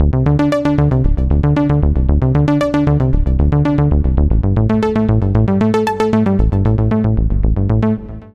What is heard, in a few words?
SynBass (D) 115bpm; Music Loops; Movement; Ableton; Singularities